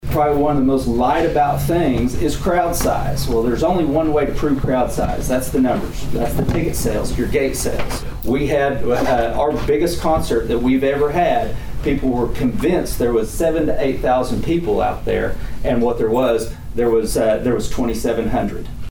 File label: Cavalcade Crowd